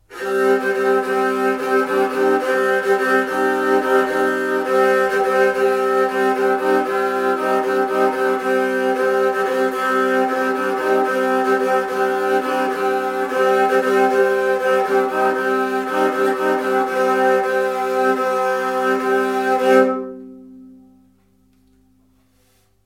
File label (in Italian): Abete bianca acustica, tagelharpa